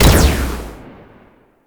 Spear Gun Shot; Warframe; Tenno; Hoplite

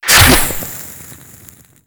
Bow Fire; Warframe; Weapons; Stalker Bow

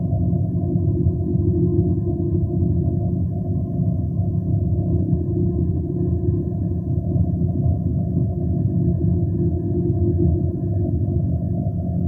Bridge Hum; Liset

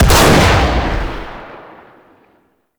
Pump Shotgun Shot A; Weapons; Warframe; Zariman

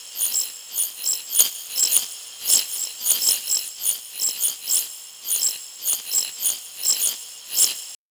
Ambient Tech Drone; Warframe; Tau; Ambience

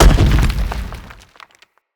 Blunt Heavy Impact Rock; Weapon Impacts; Player; Environment

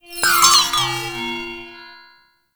Enemy Movement, Foley; Warframe; Follie Frame

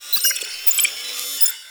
Computers Random; Warframe; Grineer; Computer Room

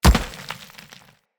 Hammer Impact Dirt; Weapon Impacts; Player; Environment; Tuvalkane Hammer

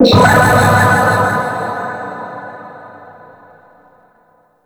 Missile Platform Shield Activate; Warframe; Grineer

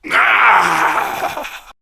Death, Male; Warframe; Grineer Male B; Battle Barks

